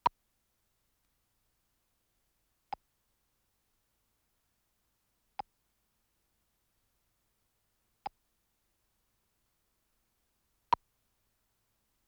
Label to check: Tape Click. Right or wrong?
Right